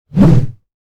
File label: hammer large whoosh; soundmorph